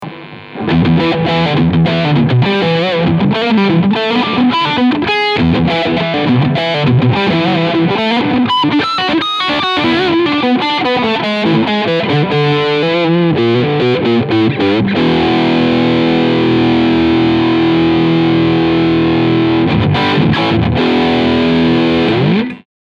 Epiphone Les Paul 1960 Steg PU Overdrive; Bonamassa LTD

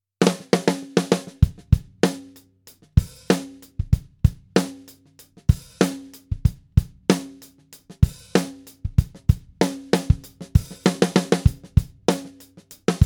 Stahl tief gedämpft

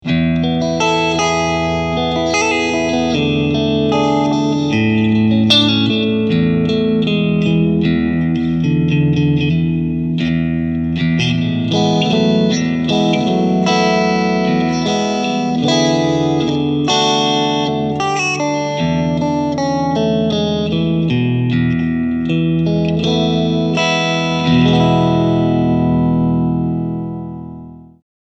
Clean mit Hall

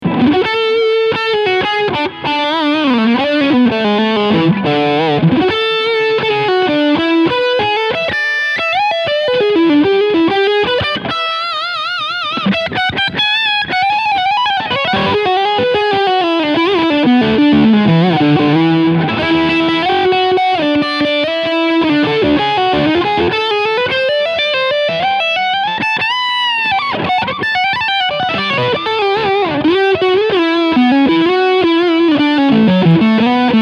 Leadsound Steg PU

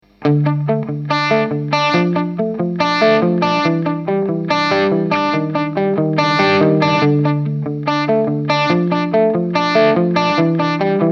Nux Solid Studio IR Pow Amp Sim Endstufen

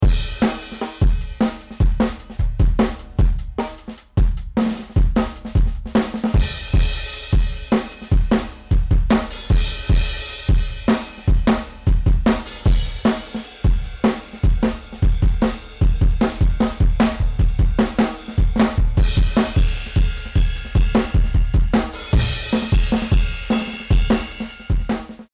Schlagzeug VC-76 Ratio 1 volle Sättigung

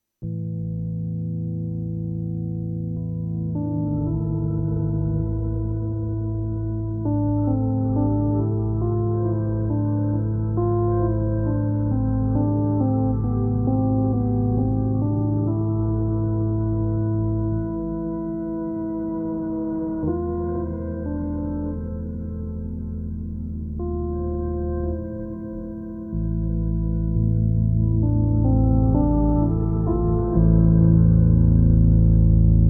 verstimmte Orgel

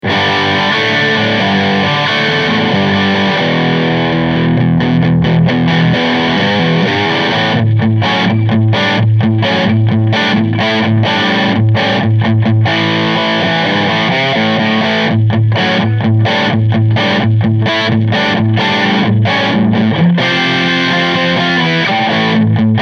Brit 800 Trocken

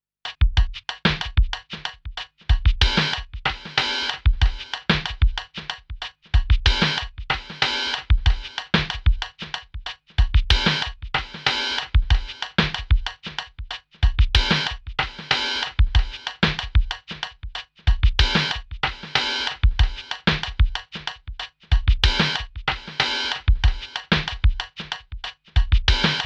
Drumtraks Groove mit Delay

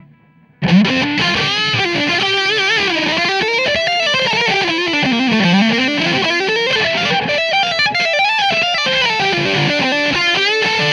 Rectifier Microphon

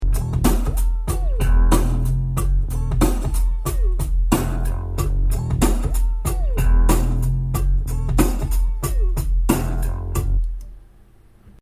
Loop 2 Mikrofone

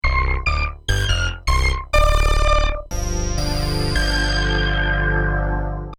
schwirbelklang; moogulator

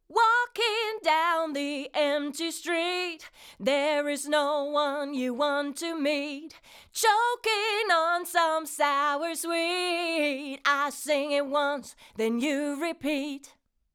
Vocal 2 zu 1 max. GR 4 dB; Golden Age Project Comp 554 MK II

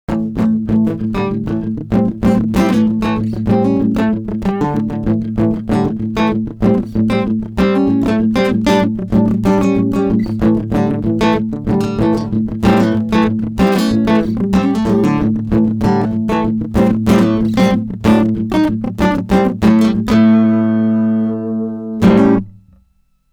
Chorus Speed 12 Uhr

